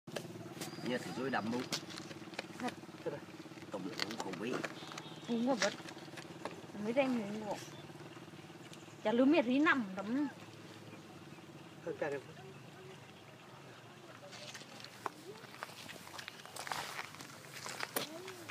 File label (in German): Schlachtung Zerschneiden